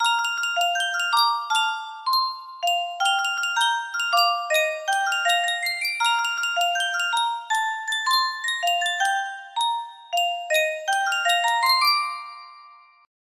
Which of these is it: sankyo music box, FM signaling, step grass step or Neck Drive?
sankyo music box